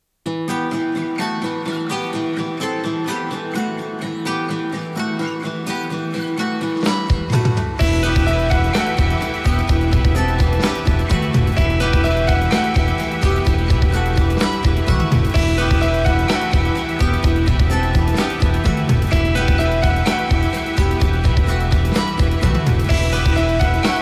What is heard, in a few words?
v7000 acoustic; vmaxg